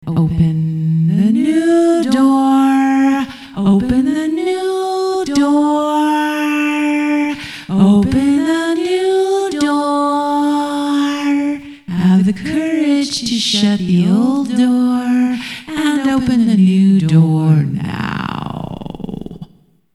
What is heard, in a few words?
new door